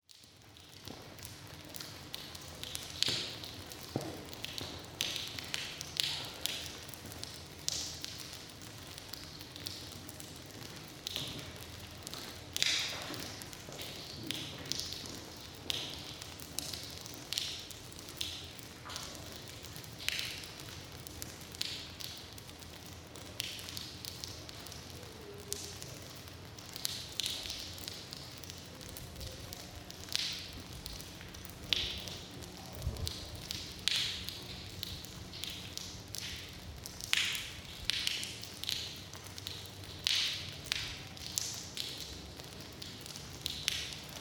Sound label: Rain; Roncesvalles